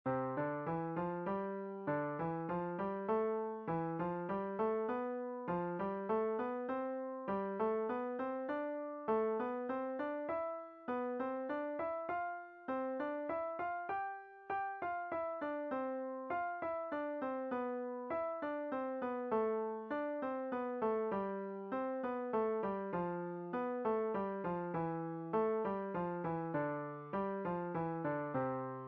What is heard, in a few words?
Five finger step, piano